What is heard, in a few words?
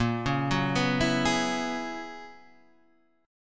Gb 11th Bb